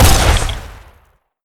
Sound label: plasma gun fire